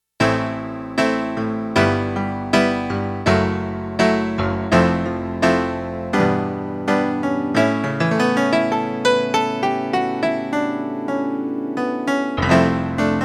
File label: PIANO, Roland JX-1; Factory presets